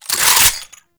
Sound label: Scaldra AK Reload Chamber